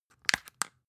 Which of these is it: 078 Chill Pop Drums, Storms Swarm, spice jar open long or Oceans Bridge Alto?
spice jar open long